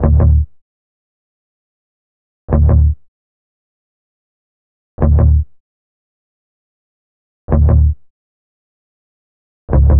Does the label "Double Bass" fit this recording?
Yes